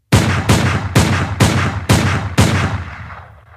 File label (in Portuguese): barulhu de tiro